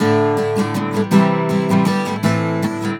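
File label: guitar, Celtic Flavours; USB Soundscan vol.42; AKAI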